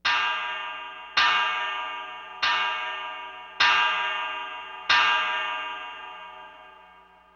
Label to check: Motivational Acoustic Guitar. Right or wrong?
Wrong